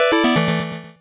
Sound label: 8bit se